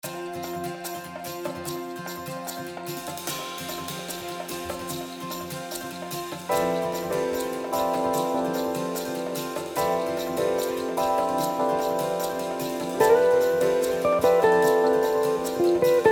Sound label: Bossa Nova; New Wave